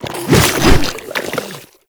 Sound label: Proto Infested Ancient Strike Flesh; Warframe; Abilities